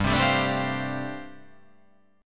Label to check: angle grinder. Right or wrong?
Wrong